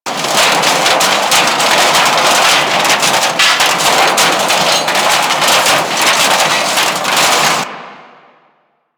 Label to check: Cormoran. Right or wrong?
Wrong